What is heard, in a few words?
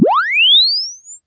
Press Two Sides Chirp; Samsung 4 Recordings